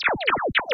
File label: stick on bar off; eiffelmedia; brick breaker